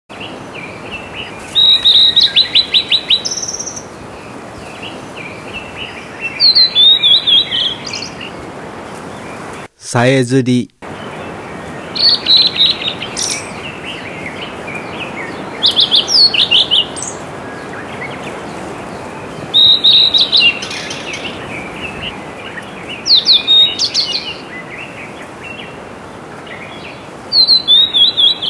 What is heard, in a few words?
ooruri, bird; azamidani